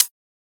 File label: HiHat, Psytrance; Drum Shots